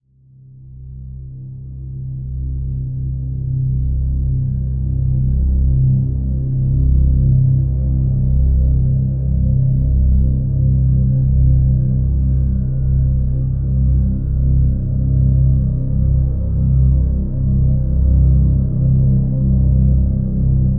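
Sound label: drone C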